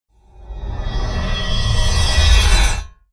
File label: cinematic reverse